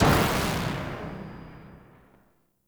Arch Dual Pistol 2D Tail; Warframe; Archwing

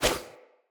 Bow Melee Arrow Whoosh; Weapons; Player; Bows; Grand Father Bow

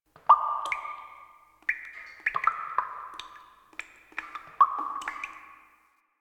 Water Drips; Nineteen Ninety Nine

